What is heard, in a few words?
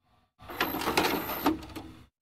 tape insert